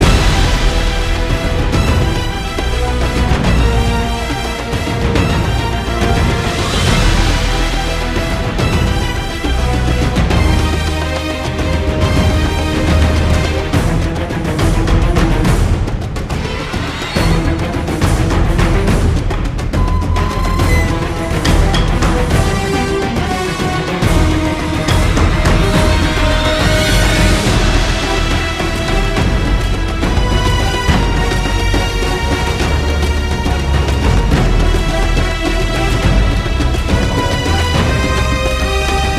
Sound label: Jungle Theme Drum; Marlow Briggs and the Mask of Death